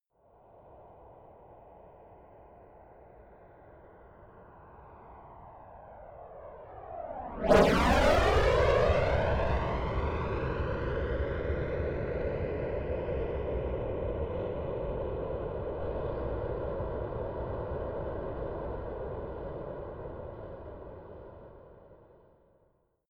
military jet f-22 raptor flyby right to left fast close